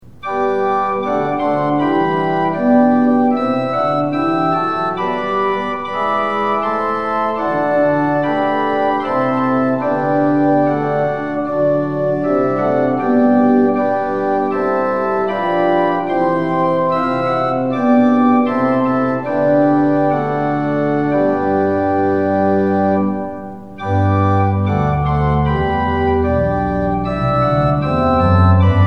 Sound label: tune; hymns; lillenas